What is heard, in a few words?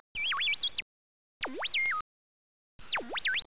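computers; 12000 Graphics Pack - A Complete Graphics Collection For Your PC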